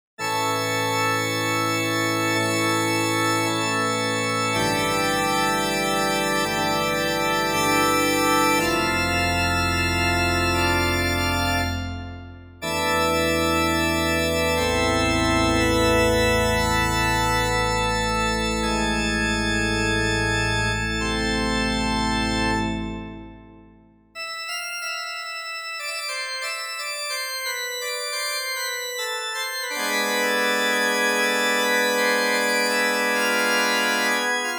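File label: Church Organ, Korg MSC-1S; ChurchOrgn; Korg MSC-1S Variety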